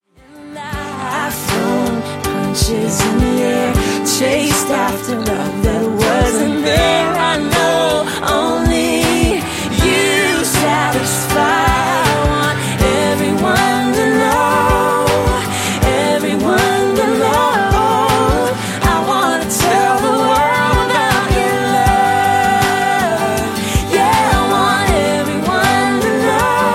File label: acoustic version